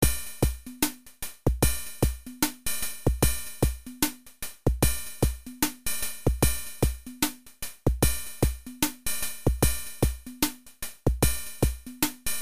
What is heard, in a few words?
swing